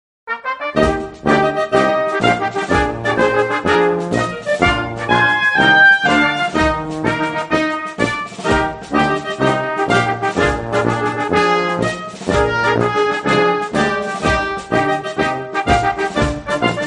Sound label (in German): MARSCH ZUM VOLKSFEST